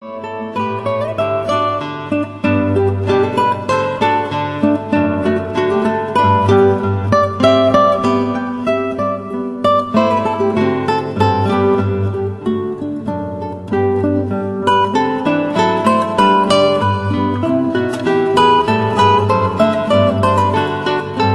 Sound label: Minuet and Trio 3 Guitars